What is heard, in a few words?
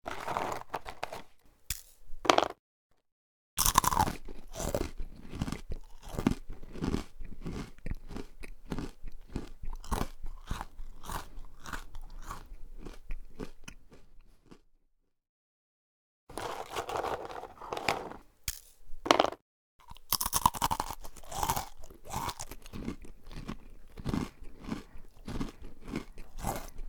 pick up, break in half and eat pretzels; human; NS Studios - ultra sound collection